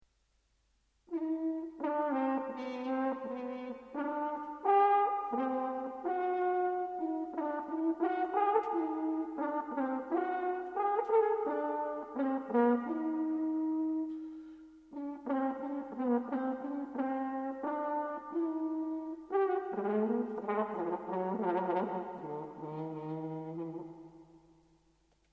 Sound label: bass